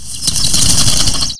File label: Game, 游戏音效; 特酷声音素材库